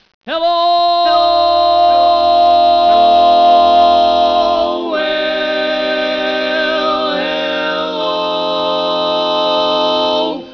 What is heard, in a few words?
hello, midi